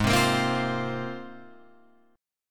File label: Ab 6th Add 9th Ab, Guitar, Open F; 8,7,10,7,8,7; Ab6add9 strummed